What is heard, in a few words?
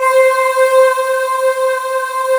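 CHOIR BELL C5; USB Soundscan vol.28 - Choir Acoustic & Synth; AKAI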